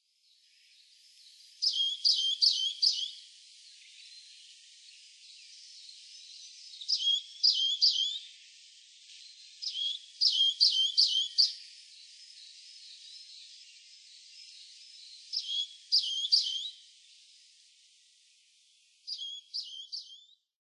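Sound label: Japanese Tit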